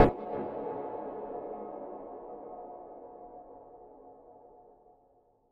carhonk ambient hit

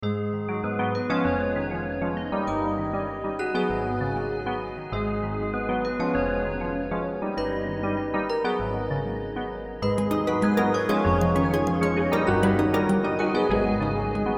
chimes

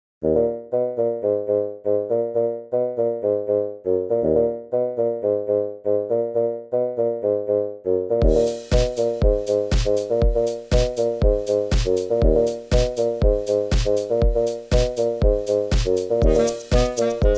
bassoon; humongous

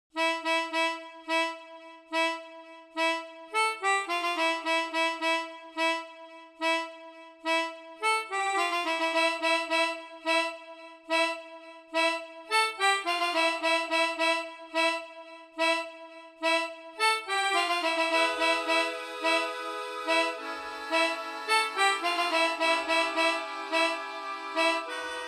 melodica

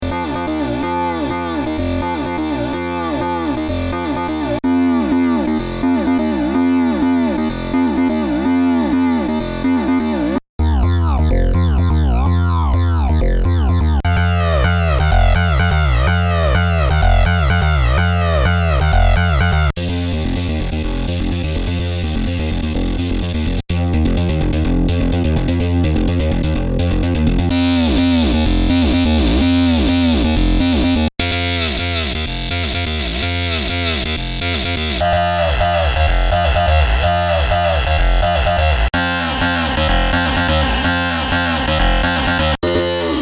heavy basses

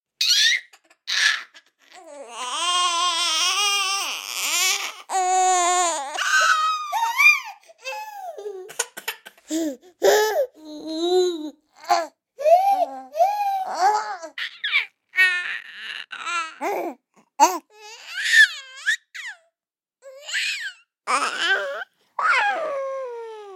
baby sounds

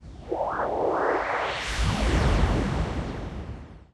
galactic firework

